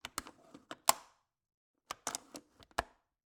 Cabin, window, latch, flip, lock, open close, hyper; Eiravaein Works; Vaeyan IV